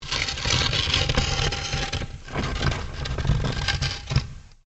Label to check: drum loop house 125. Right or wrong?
Wrong